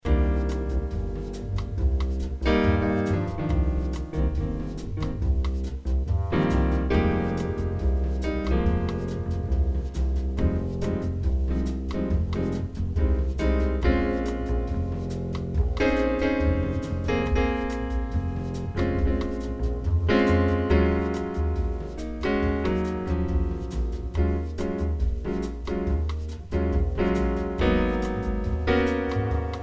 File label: Cycle 5 Min7